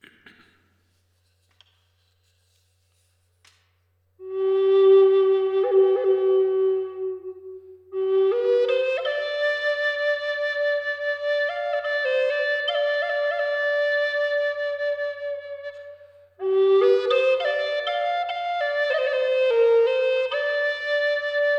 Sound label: Solfeggio 396 Hz